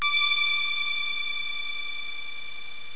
DING

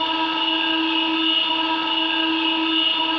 SCAN

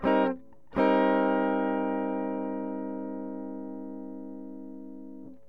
MIN 7TH, GUITAR ELECTRIC

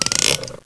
ratchet

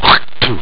fire; mechamoid

